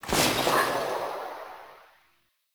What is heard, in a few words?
Intro SFX Track Scene; Warframe; Caliber Chicks Minigame; Title Menu; Intro Outro